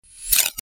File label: Sword Sound; FXHome; Fighting Sounds